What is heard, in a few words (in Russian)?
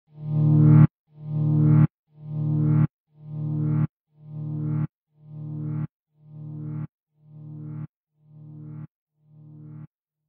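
эффект эхо на гитаре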